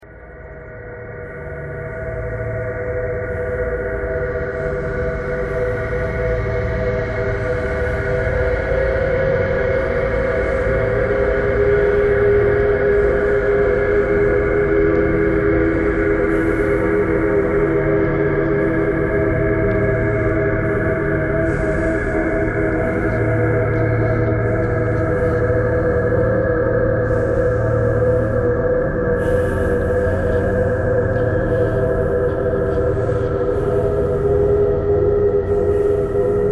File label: thread buzz